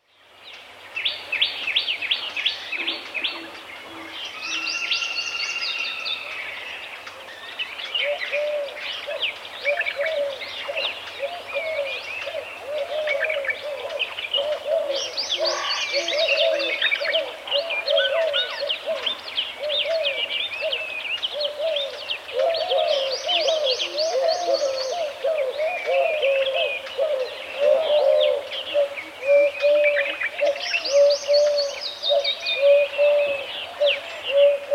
Dry deciduous woodland, winter, early morning, Lal Suhanra, Pakistan, very busy, with some close up calls from bulbuls, crow-pheasant, mynahs, parakeets; warblers, doves and treepies; Outdoor; BBC